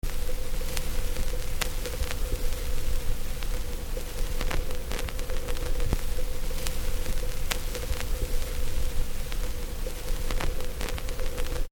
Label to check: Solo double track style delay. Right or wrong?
Wrong